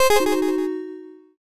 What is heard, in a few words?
eliminate